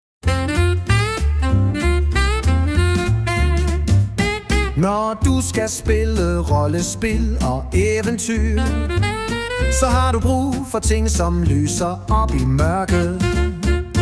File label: jingle